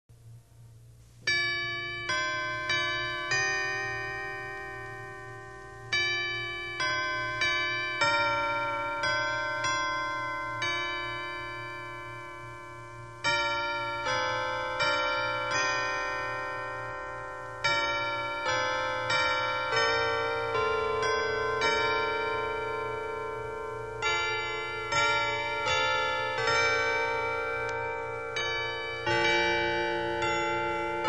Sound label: carillon chimes